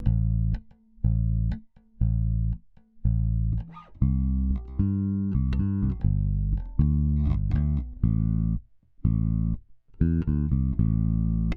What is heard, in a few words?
560a bass; Modified DBX 560a; Revive Audio